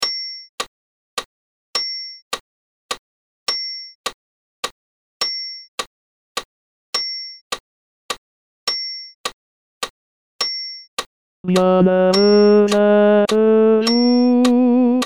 Si le Pere vous appelle Tenor, Chorale

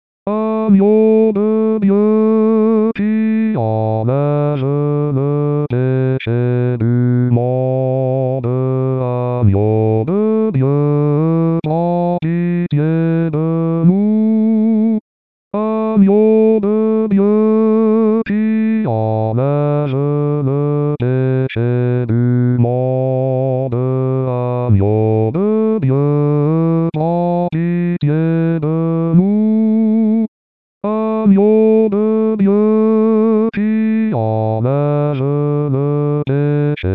Agneau De Dieu Basse, Chorale

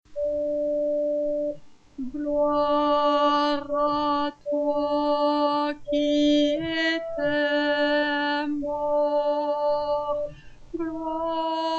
Anamnèse Tenor

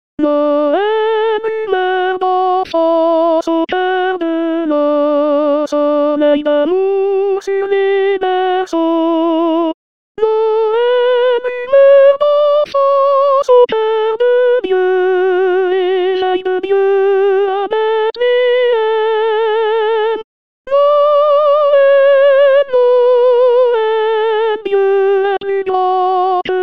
Soprano, Chorale; Noel

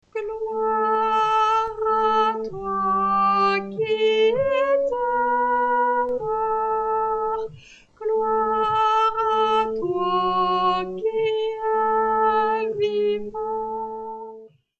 Anamnèse Basse, Chorale

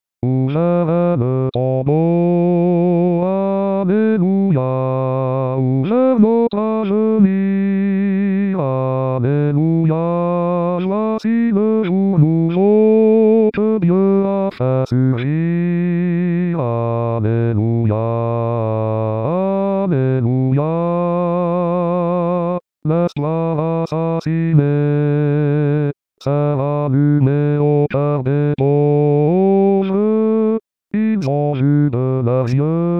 Ouvert est le tombeau Basse, Chorale

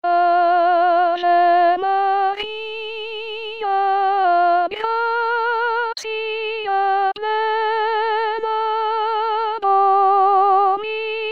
Ave Maria Soprano, Chorale

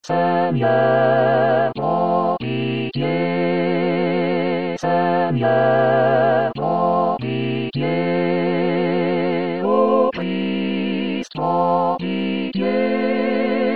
Kyrie Messe Réconciliation

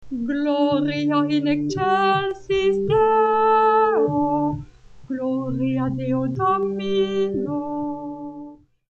Gloria Basse

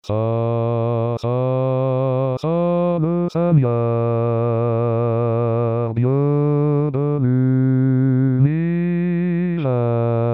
Sanctus Basse; Paques

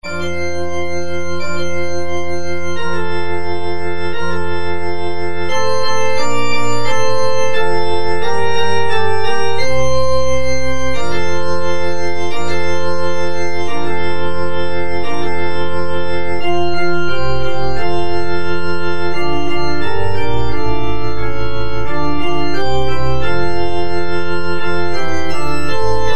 Noel Noel Instrumental Orgue; Chorale; Epiphanie

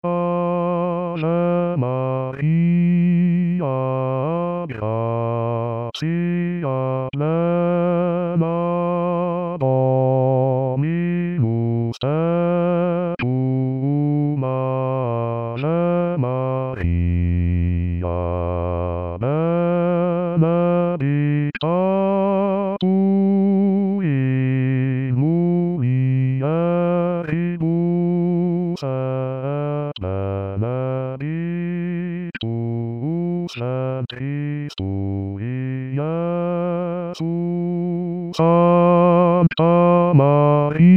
Ave Maria basse, chorale

